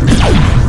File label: tau shot